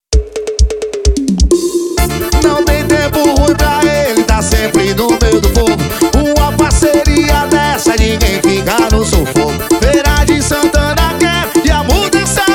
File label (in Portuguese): jingle